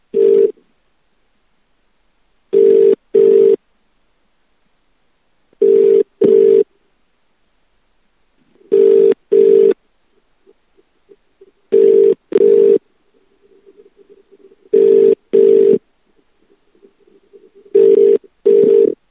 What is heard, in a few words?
Ring Ring